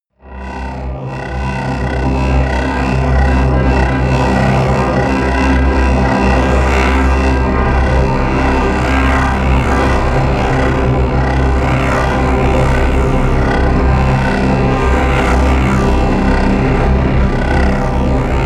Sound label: drone talk box